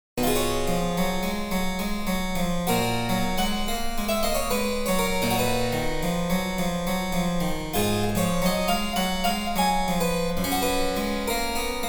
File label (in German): Cembalo; Mark Lindley WTC 1